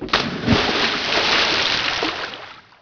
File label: Splash